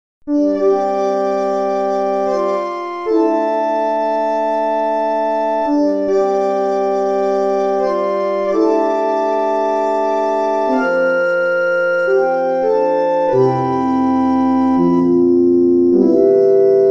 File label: fanfares; modelonia